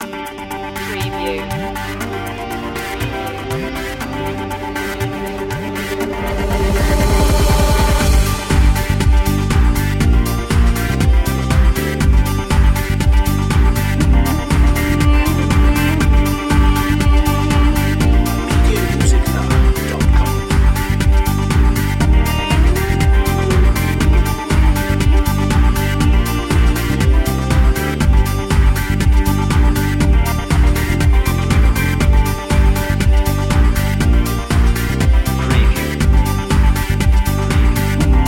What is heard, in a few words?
oriental fantasy